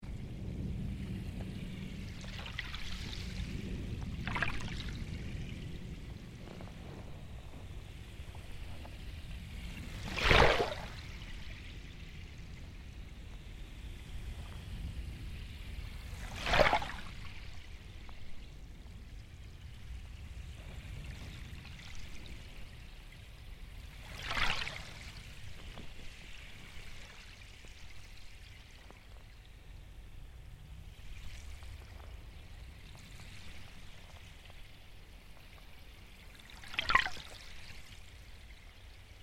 Water Waves Small Underwater Loop, Waves at StKilda beach; Ocean; Nature; SoundEffects-Foundation4